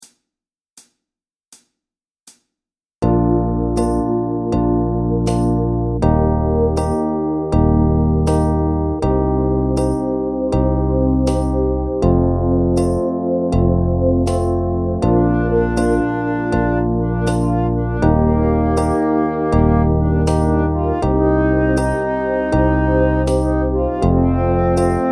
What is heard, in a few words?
Drum Grv Med